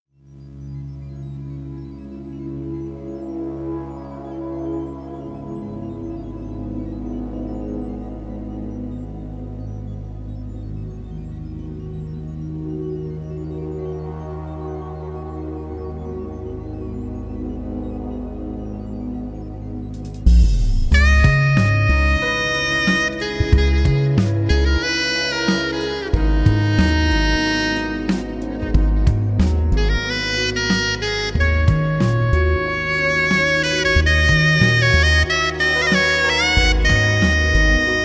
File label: Soprano Sax